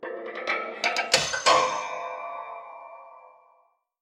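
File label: metal cable sounds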